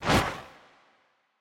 random Wild Whoosh; bloodsuckers2

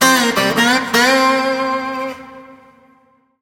medium Win Riff; dead or alive 2

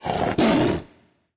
panther fx high; serengetikings

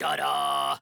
Robot Dance; eldorado

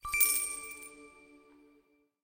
coin Win Update; imperialriches

